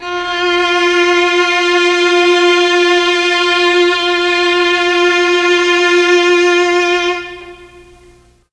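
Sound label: F2 violins, mellotron; CHMB 3 VLNS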